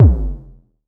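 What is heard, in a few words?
vermona drm1 mk3 bass drum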